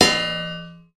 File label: impact metal heavy thump clunk